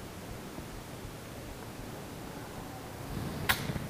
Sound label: faint scream or moan